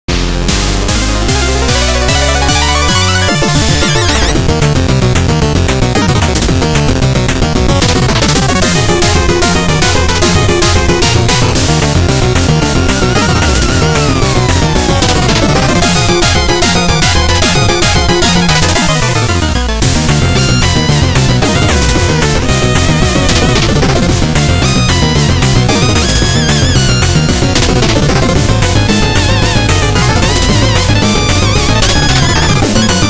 Music Boss; Boss Champions; Shovel Knight King of Cards

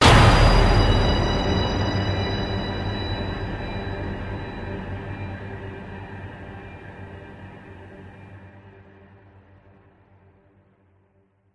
Elevator Sting; Silent Hill Downpour

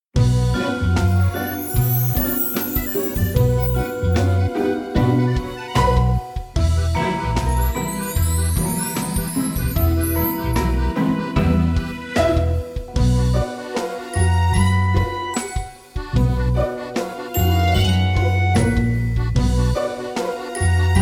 Dialogue Tention; Miraculous Rise of the Sphinx

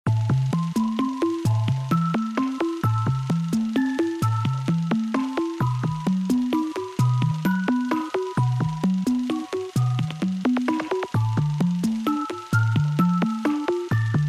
Broken 8-Bit Theme; FNAF World

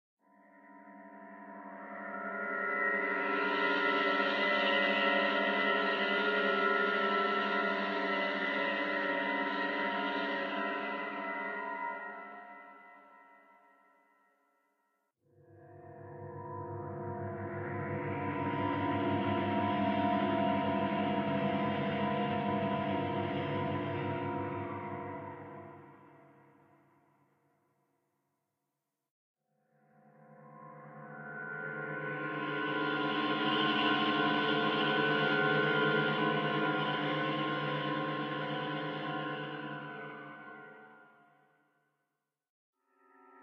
Music Forest; Fone; Dead Reefs

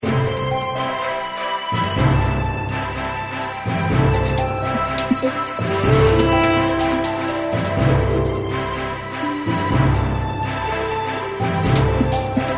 country music; the saga of conquest; android

